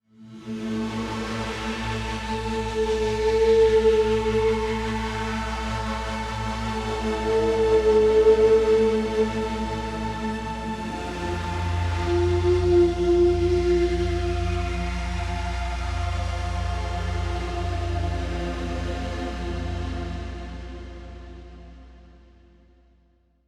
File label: Hangar Ambient Random; Ambient One Offs; Warframe; Railjack